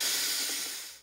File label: Open Hat